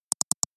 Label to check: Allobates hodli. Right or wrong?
Wrong